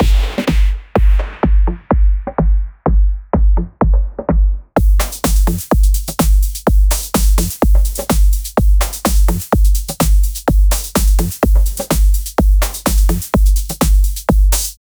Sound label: futuristic deep house, electronic